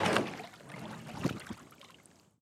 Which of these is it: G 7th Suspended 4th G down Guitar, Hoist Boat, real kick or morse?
Hoist Boat